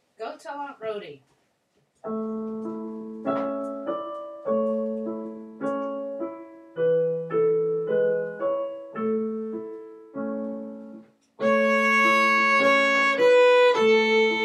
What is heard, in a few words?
go tell aunt rhody, Strings